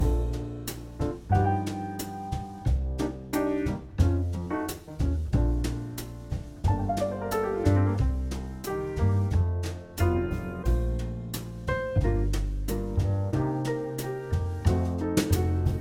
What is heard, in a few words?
Bossa Nova Jazz